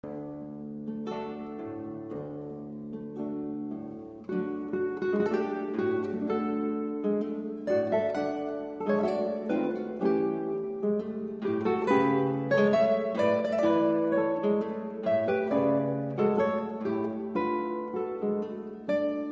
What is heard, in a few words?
tango, concert guitar trio